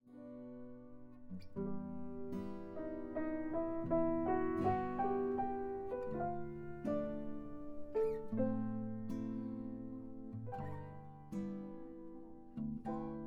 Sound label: Room Dry ECM-100N; microphone; hi-res